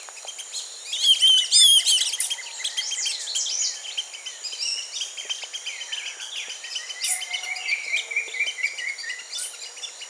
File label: Black bellied Whistling Duck Dendrocygna autumnalis